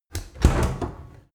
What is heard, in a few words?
fridge close